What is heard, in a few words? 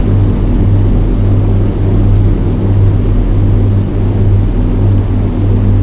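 avro lancaster cockpit left, engines; War Thunder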